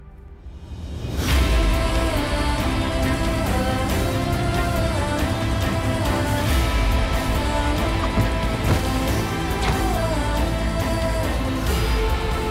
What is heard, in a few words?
Instrumental BGM Ringtone; Panga